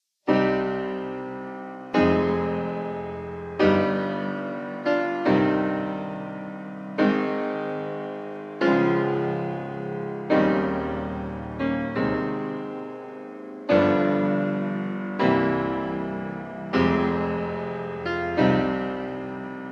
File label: Prelude No. 20 in C minor; F. Chopin; 24 Preludes, Op 28